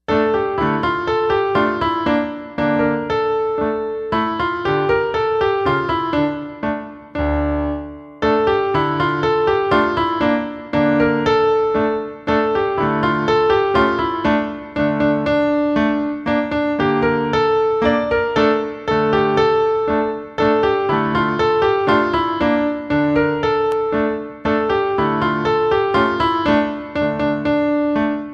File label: music only; childrens songbook; Chinese